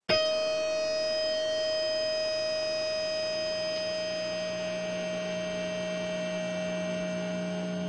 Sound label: heavy harmonic tune up 1st